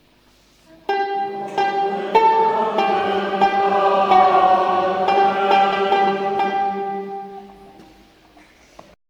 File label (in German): 3 Faches Amen